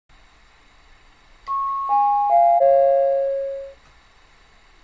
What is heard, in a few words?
down